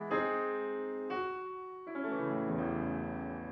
rubato

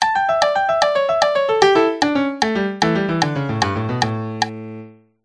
Vl2 Vla Vc; Udelt takt

